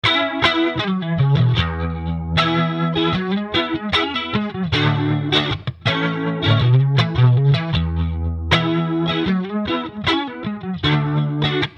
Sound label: Rotary Tweed 4x10